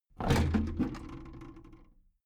big plastic case lid lift heavy

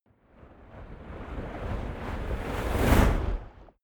waves surge shot decay

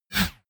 whoosh metallic synth short

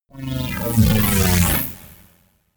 whooshing bass drop short

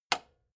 metal button spring push

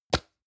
plastic can small lid close hard

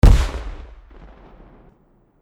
large explosion

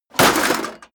metal plate armor hit

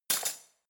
cutlery spoon drawer throw

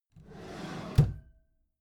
empty drawer smooth open regular

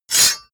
sword cut hard slash

